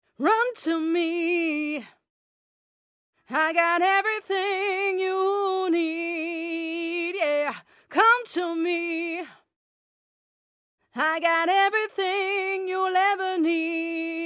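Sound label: house dance pop 125 E major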